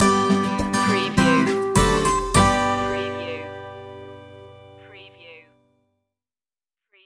our happy place stinger

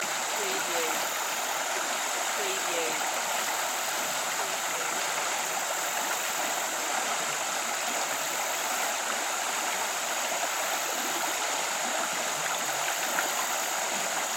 Forest Creek Birds

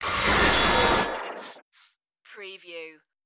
electric starship door

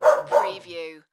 Dog Barks Twice

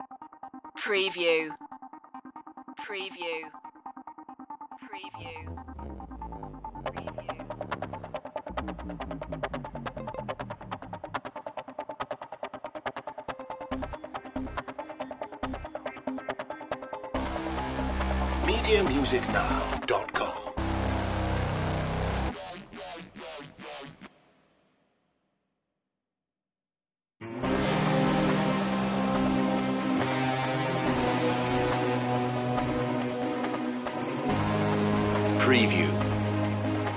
apocalypse no perc

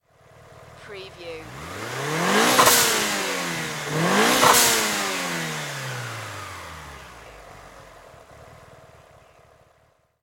MITSUBISHI EVOLOUTION ENGINE DOUBLE REV

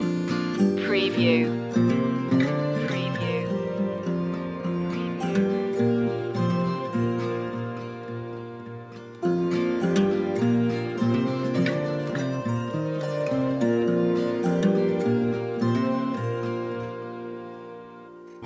barn raising loop

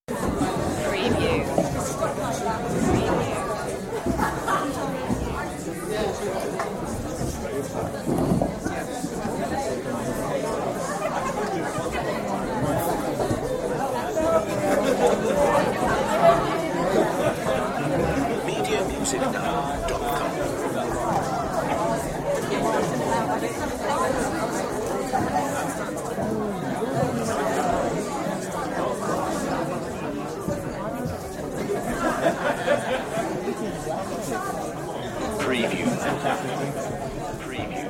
Audience Chatter Crowd Ambience; beanstalk audio

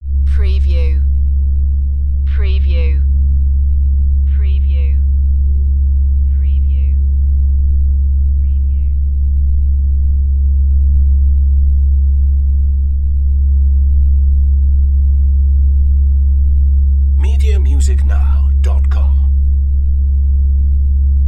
Mega Sub Bass

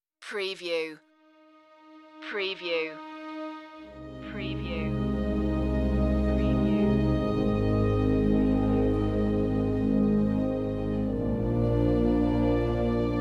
building castles strings only

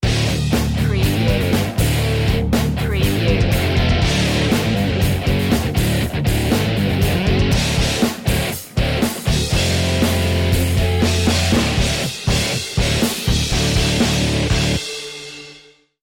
metal sting

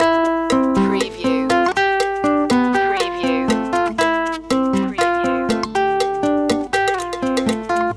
montuno loop

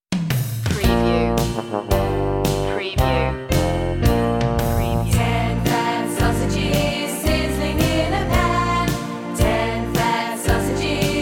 ten fat sausages

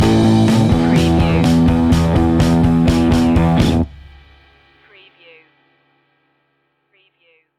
rock with us stinger

